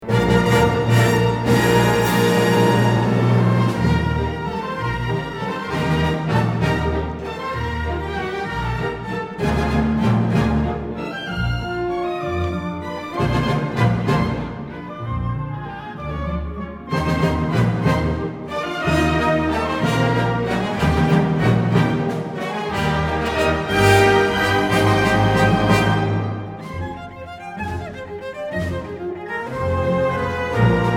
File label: Waltz